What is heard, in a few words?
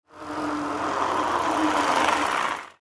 arrive